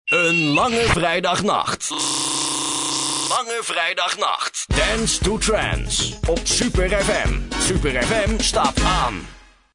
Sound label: Jingle; Super FM; dance2trance; gijs zegers